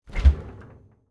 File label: fridge open, kitchen